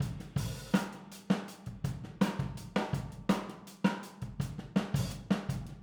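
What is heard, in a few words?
Boogaloo, Overhead Stereo, 82 BPM; Drums; Verse; Sonic Foundry; Drums from the Big Room The Mixes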